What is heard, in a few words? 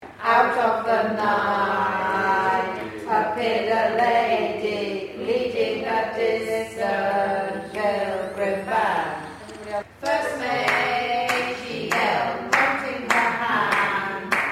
Rhythm Lines